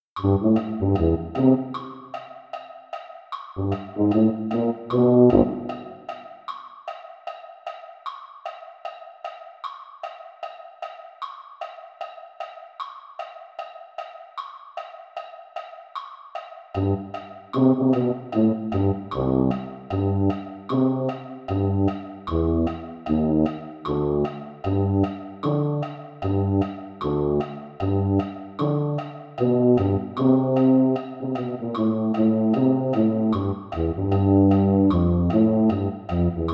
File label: tuba; holiday brass